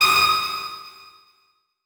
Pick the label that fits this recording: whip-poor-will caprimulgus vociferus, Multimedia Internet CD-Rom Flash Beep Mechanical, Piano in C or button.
Multimedia Internet CD-Rom Flash Beep Mechanical